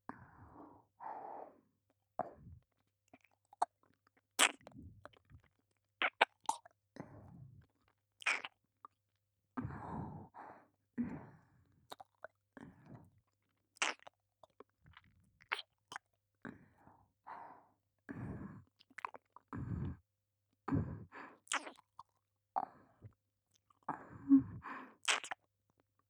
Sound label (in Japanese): 耳舐め 弱い